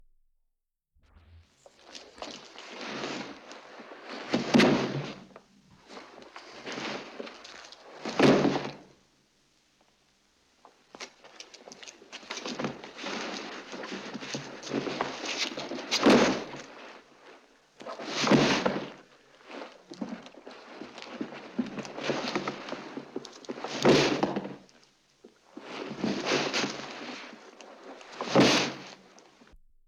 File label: Fight And Body Falls On Stone